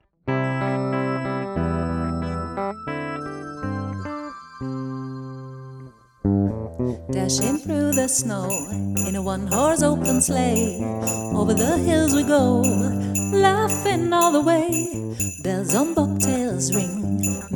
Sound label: Jingle Bells